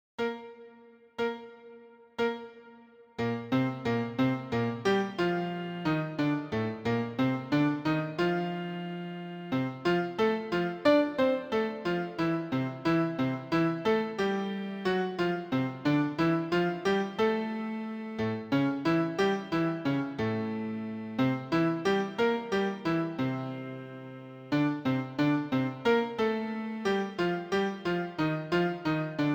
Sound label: Study trom fast; Blazhevich